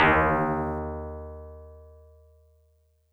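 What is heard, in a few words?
Tubular Bells DX100 D sharp 1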